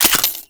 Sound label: Pistol Mech; weapons; Warframe; Grineer; Orokin